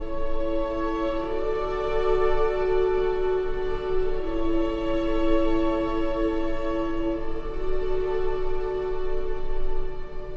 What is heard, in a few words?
for cello and strings; Archi di Santa Cecilia